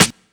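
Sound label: Hip Hop R n B snare drum; USB Soundscan vol.19; AKAI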